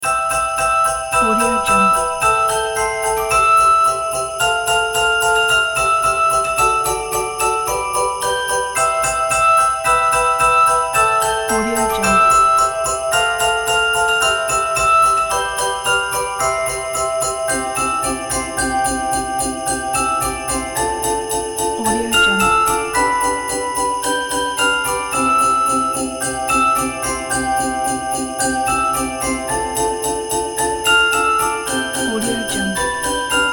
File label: jingle bells